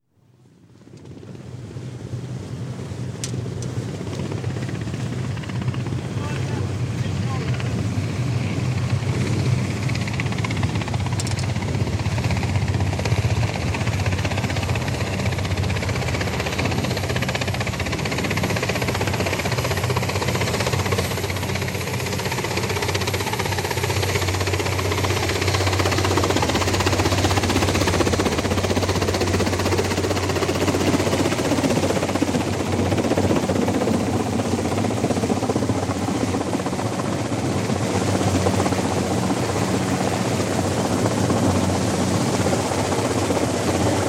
Lifeboat, deck pick-up by Whirlwind Helicopter (48ft 6in Oakley class self-righting boat); RNLB 'Charles Henry'; Airplane; BBC